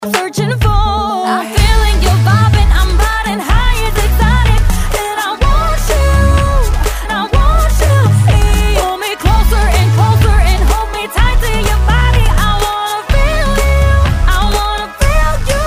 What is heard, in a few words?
DANCEHALL